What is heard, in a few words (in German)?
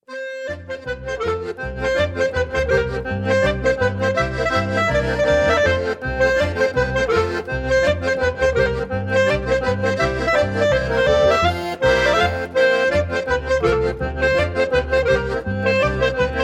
Hummelmusik